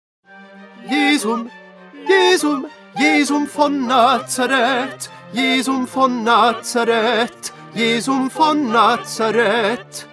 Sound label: Tenor; Bach; St John Passion